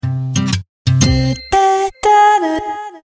Signature; SNCF; relift

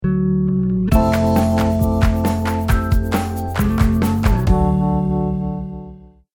videocast intro jingle

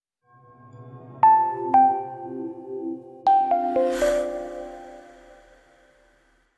signature; samsung

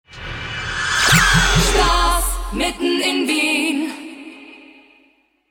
audiologo; prater

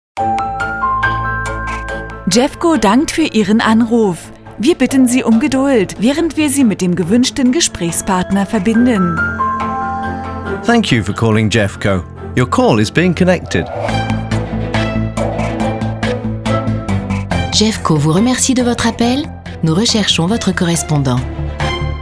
hold on music; gefco deutschland

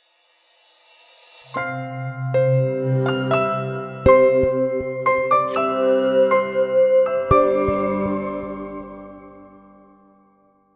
Soundlogo

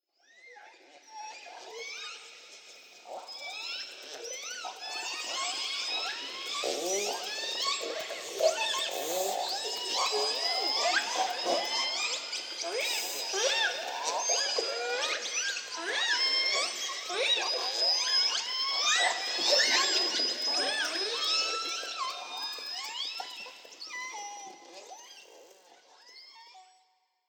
whalesong

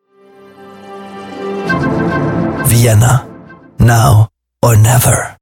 Soundlogo Claim EN; VTB

